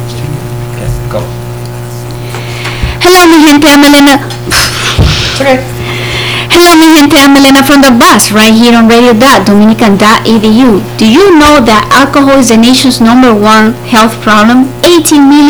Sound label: old vocals